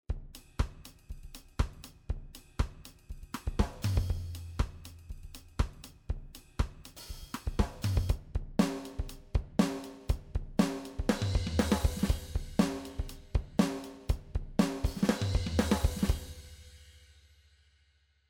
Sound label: MOOER Drummer Pop Fills Stereo Out